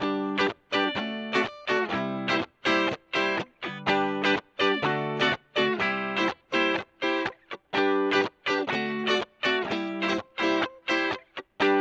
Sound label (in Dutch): gitaar loop